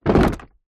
foley plastic pot tub down on ground

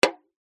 foley drink can cola impact liquid inside

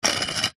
foley brick slide on other brick short movement

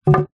wood plank down on concrete; foley; zapsplat

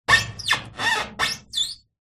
vehicle car tyre rub squeak metal

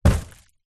foley cardboard box large drop or impact

